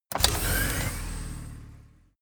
artillery missile truck ready

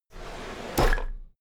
drawer cabinet equipment open regular

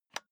office chair lever

